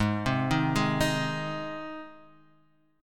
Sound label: G sharp Major, Guitar Half Step Down